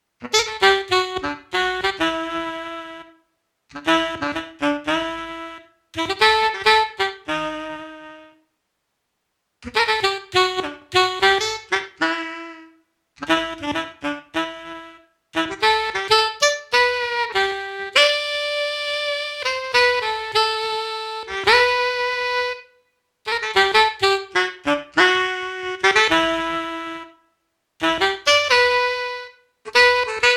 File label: Sax, Cakewalk TTS-1 Isolated; Megaman X